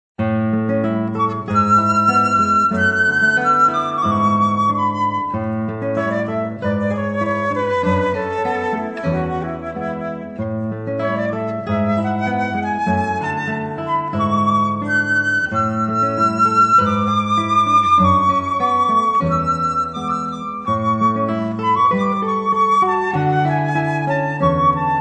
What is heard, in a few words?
flute; Fly, Little Bird; kissed by a flute